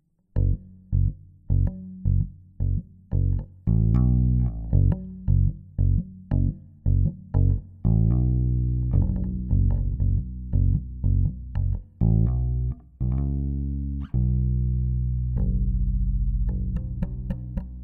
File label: bass intro